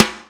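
perfect acoustic snare A key, snare tuned to the A note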